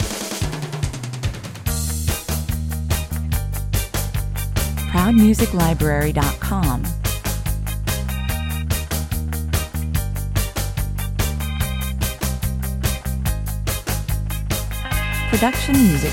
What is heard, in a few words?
surfin beach party, 145 bpm